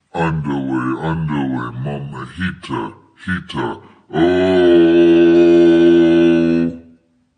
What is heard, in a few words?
undelay